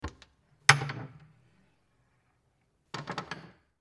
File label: Door Cabinet